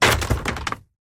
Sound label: drawers break